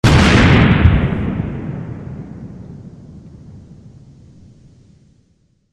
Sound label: synth rifle